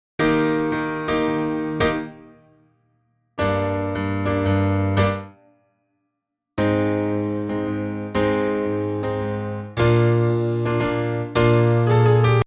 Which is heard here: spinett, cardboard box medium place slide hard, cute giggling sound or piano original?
piano original